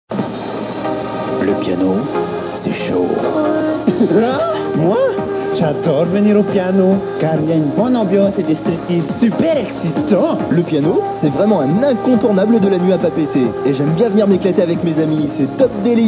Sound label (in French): le piano; Format WAVE; "Le piano" (2003 & 2004)